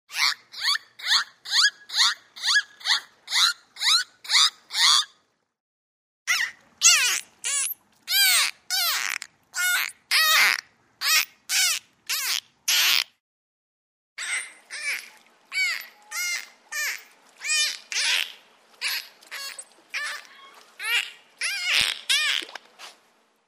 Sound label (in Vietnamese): chuoi am thanh voi ca heo